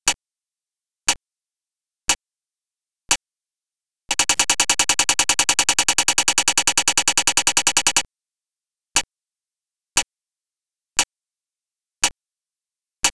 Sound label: Locator tone plus rapid tick walk indication